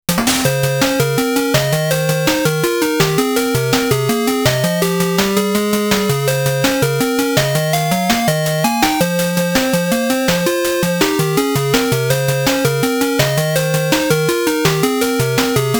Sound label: MEC PC-6010mk2 Version, 8bit; Gate 変移空間のオクテット; 8bit Original Soundtracks